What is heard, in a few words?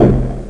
BANGER; RpgMaker